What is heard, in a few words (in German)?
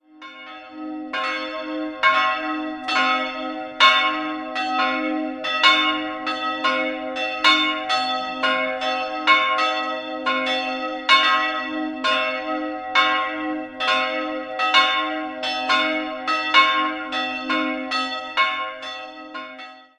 Kaising, Filialkirche, Glocken; Filialkirche Maria Immaculata; Terz-Geläute: c''-es''; Die beiden Glocken wurden im Jahr 1950 von Karl Czudnochowsky in Erding gegossen.